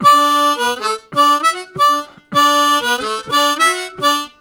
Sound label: Octave Q&A, Harmonica; Zero G